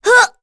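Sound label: Vox Damage, Korean; Cecilia; kingsraid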